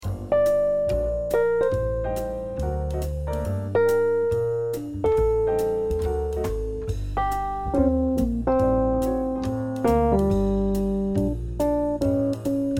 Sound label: swinging turn